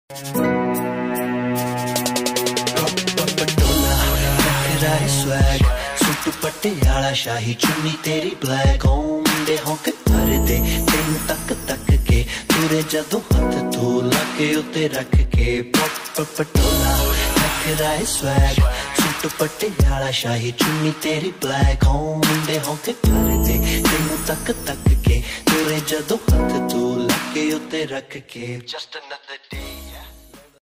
rap punjabi ringtone

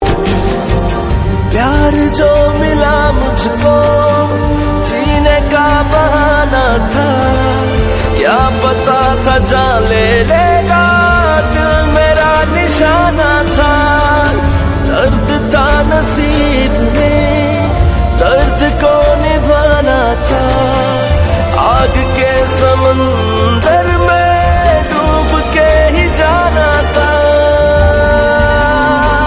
pakistani ringtone; Ost

